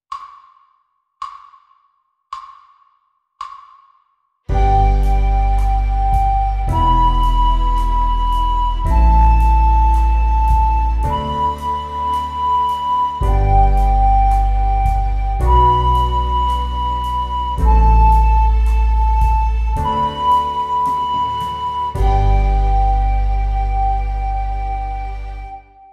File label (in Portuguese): Flauta Doce Estudo; licoes para flauta doce